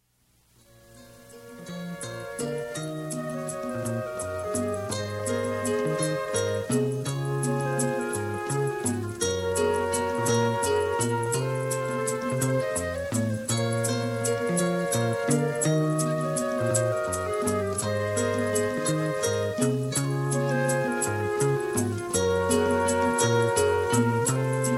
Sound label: Ringtone